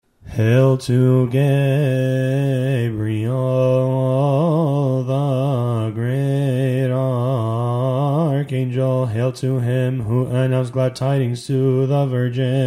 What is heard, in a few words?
verse of cymbals